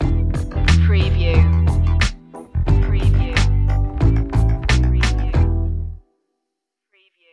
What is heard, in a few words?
groovy baby stinger